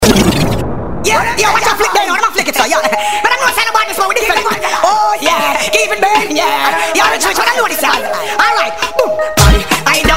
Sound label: dancehall